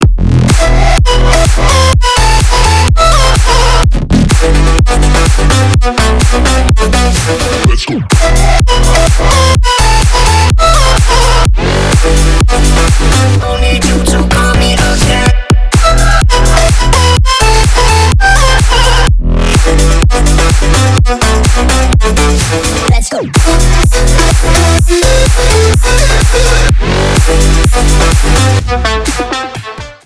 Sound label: triangle